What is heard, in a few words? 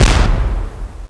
impact; NETSTORM